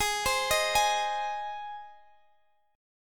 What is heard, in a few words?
G sharp Major, Guitar Open G; x,x,18,17,16,18